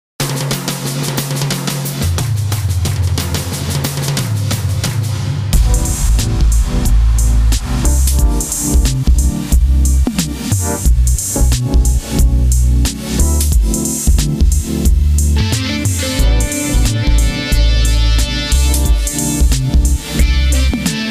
neo soul jazz; chord progression tutorial

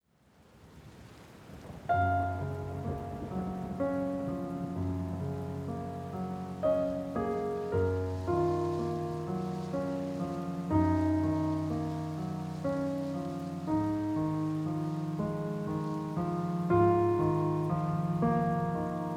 Nocturne In G Flat; Summer Night Rains

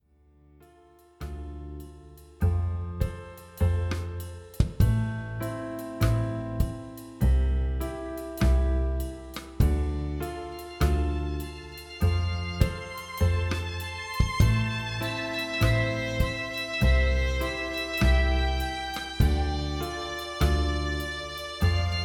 Jazzy Etude

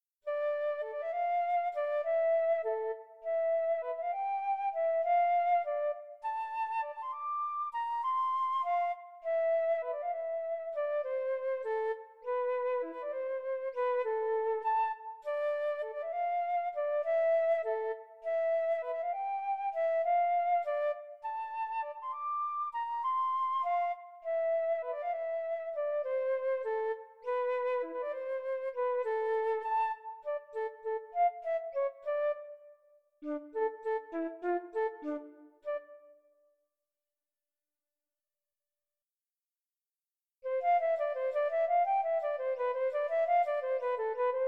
Two Sententiae for Flute

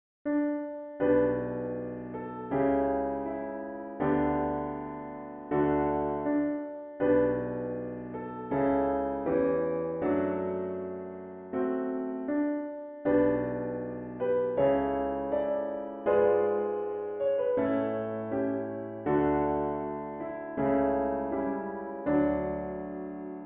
Piano Quartet Theme

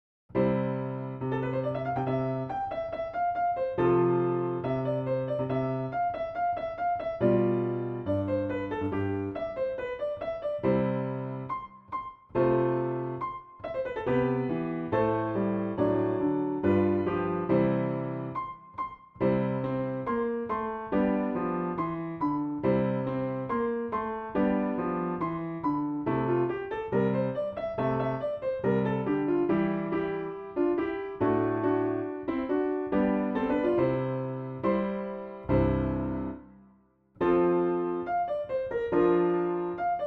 Piano

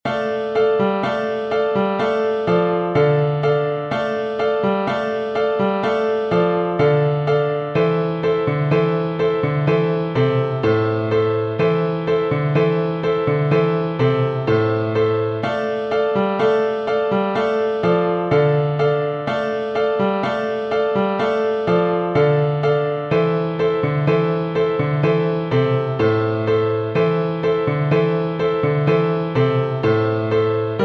Piano

